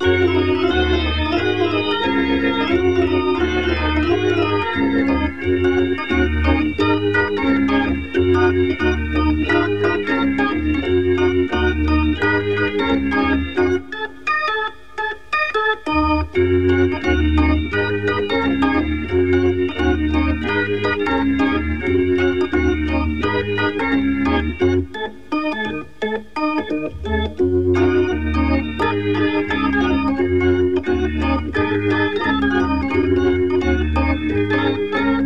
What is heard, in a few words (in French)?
graal musique fin